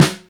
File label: Dark Snare One Shot D Key; snare drum samples